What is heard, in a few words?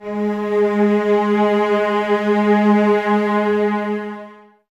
cello G#3, String Ensembles, Cellos, Expressivo; Miroslav Vitous